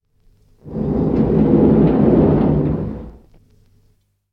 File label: bbc lift in; RunningLateGame